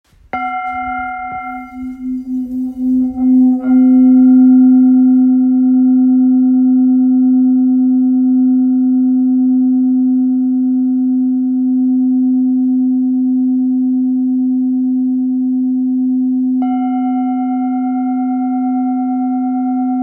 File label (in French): Bol full moon do